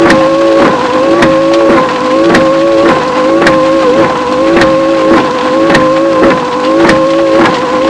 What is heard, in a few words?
WIPERS; Transportation